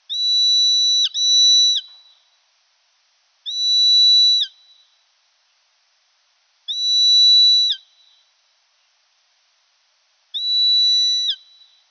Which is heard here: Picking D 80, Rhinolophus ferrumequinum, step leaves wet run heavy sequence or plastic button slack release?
Rhinolophus ferrumequinum